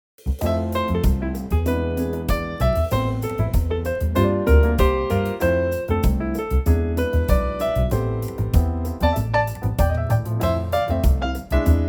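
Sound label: Am, Backing Tracks for Male Vocals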